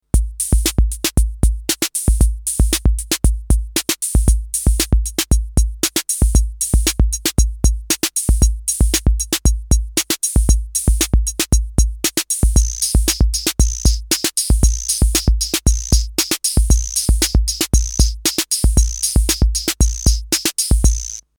TT 78 Beat Bot Rock like

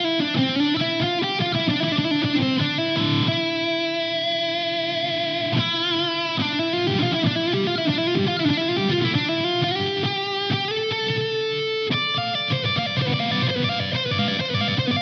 FGN J Standard Mythic 7

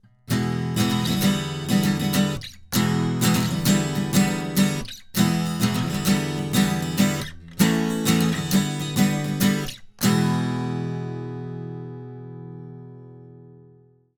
Portico 5024 NT3 silk acc gtr

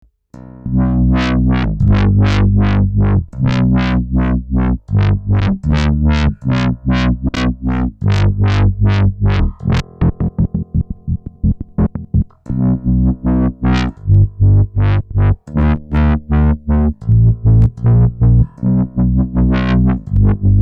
Bass Dist; SR KAOSS

